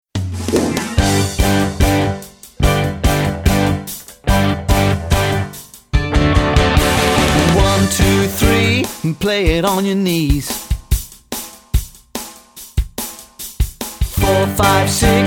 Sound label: Rockin Rhythm